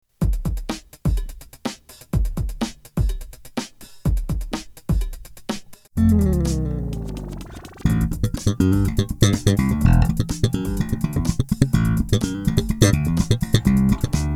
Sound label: slap, lulubassman; jb slap